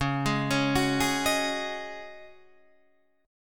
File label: Db Suspended 2nd Suspended 4th Db Guitar Open D